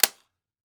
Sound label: Weapon Gun Machine Gun Thompson Sub Clip Slam; AdobeCC